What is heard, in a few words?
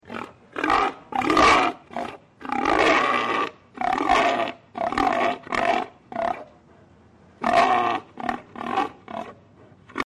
pig grunts